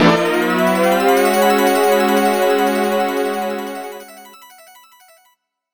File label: Race Start; F-Zero Climax; GBA